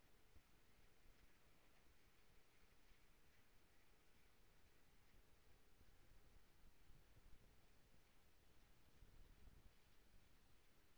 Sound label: HYDROPHONE 16000Hz; JASCO AMAR HYDROPHONE 740